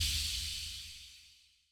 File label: reel twin end snd; netent